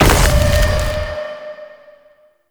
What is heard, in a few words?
Ground Spike Hit player; Enemies; Abilities; Warframe; Zariman; Void Angel